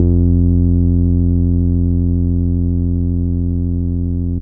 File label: round, Synth